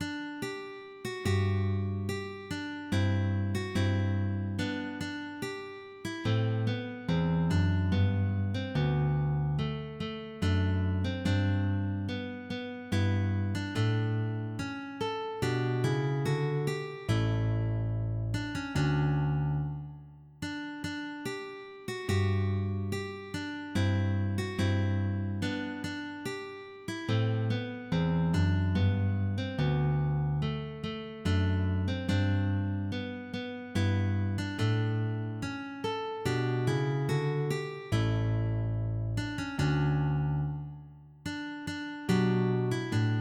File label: Sarabanda Largo